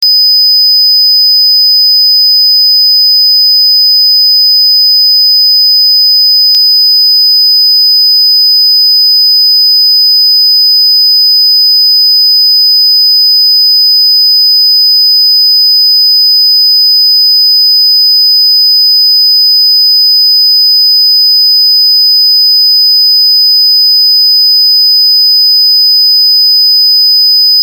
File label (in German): Dreieck 500 Hz; Dreiecktöne; Ongsiek Haustechnik